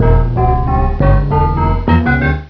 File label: death tones; CyberNation 1.1.1